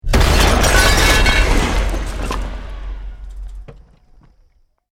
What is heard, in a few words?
EXPLOSION MEDIUM BRICK WOOD GLASS NO FIRE; Created explosion effects, house, building or vehicle effects; Bomb